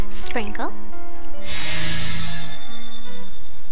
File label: sprinkle